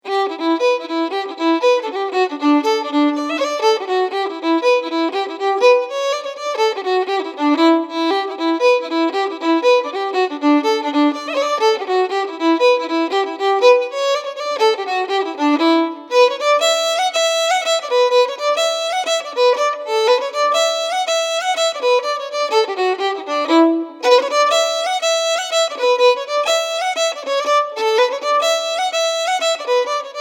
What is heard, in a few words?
Jig; Swallowtail Jig